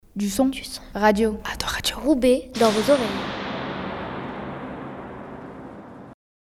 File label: Jingle; ADORADIO